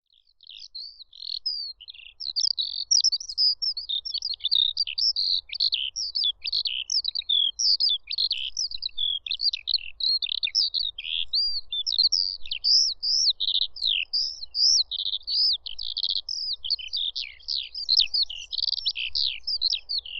alouette pour sonogramme

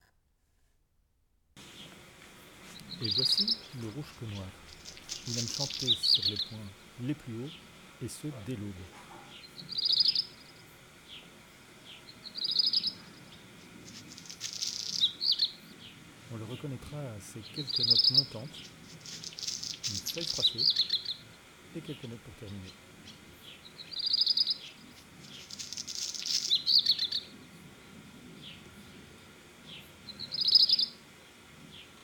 rougequeue commente

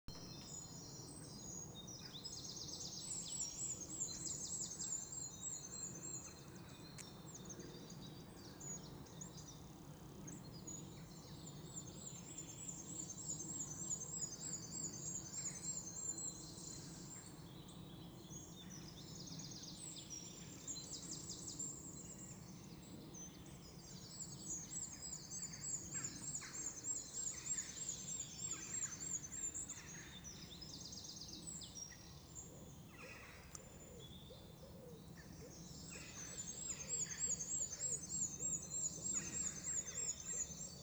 Choucas

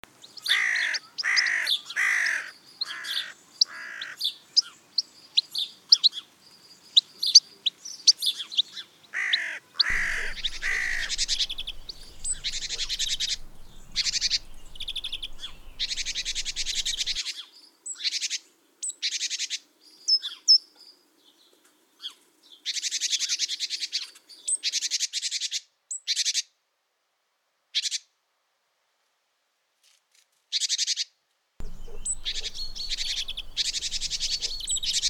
verdier

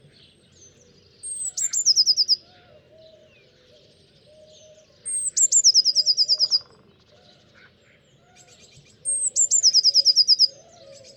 mesange bleue

